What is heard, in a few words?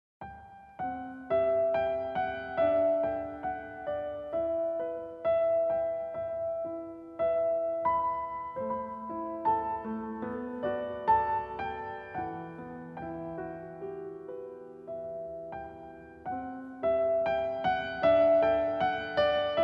Piano Solo